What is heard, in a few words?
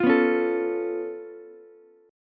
C sharp Augmented 7th, C sharp, down, Ukulele; strummed; C#+7; JGuitar